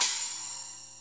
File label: CYMBAL